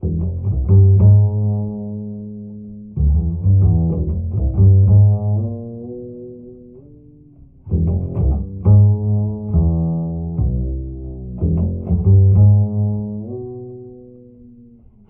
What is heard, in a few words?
bass, Loops